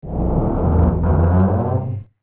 Seesaw Down

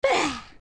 Young Ahh; Link refreshed after a drink (or breathing after a dive); OOT

